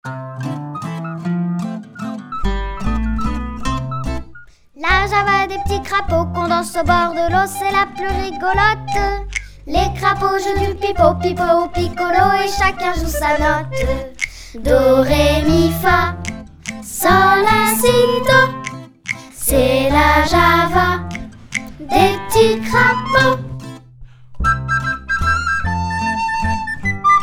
Chanson

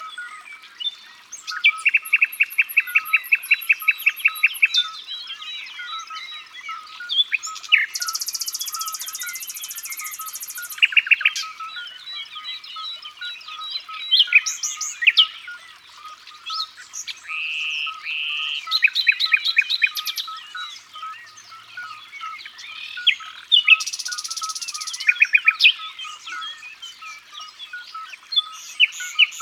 Rossignol philomele; abc de la nature

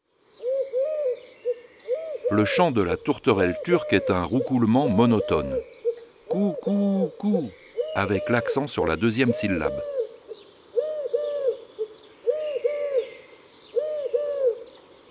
Tourterelle turque, oiseaux des jardins

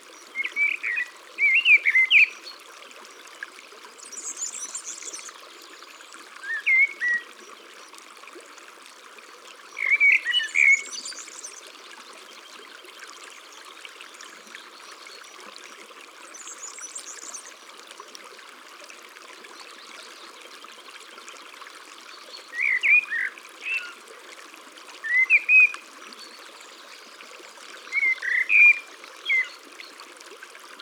Merle noir, Chants d oiseaux dans la campagne

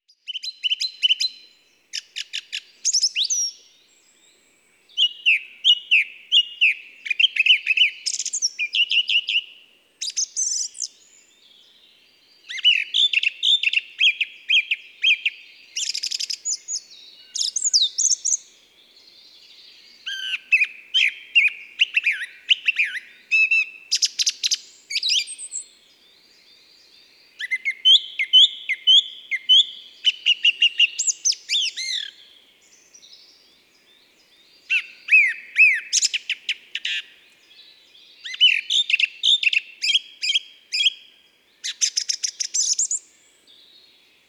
Grive musicienne; ABC des chants d'oiseaux

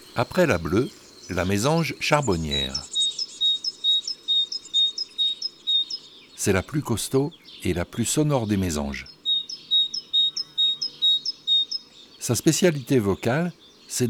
Janvier La Mesange charbonniere

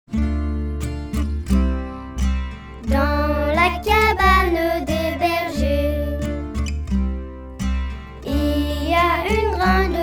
La cabane des bergers Chanson

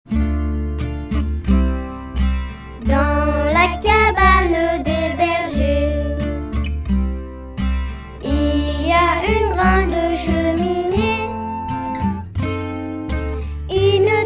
Chanson; Un jour à la montagne

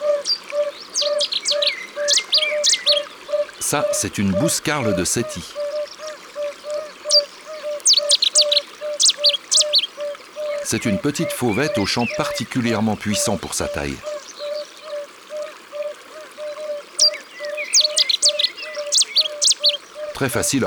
Bouscarle de Cetti; abc de la nature

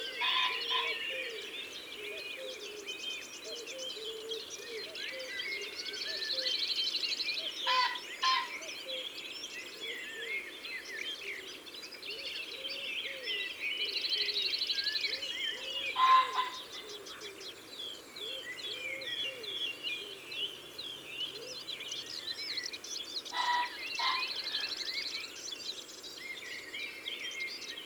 Faisan de Colchide; ABC des chants d oiseaux

